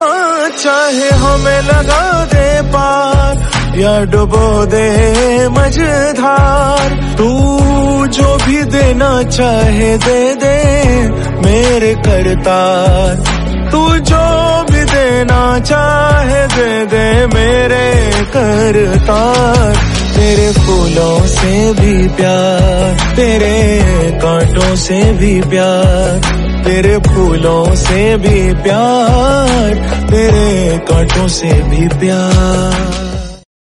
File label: Devotional; Shri Gaurav Krishna